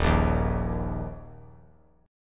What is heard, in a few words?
Eb 5th; strummed; 3,5,6,x; 3C,3F,3A,3D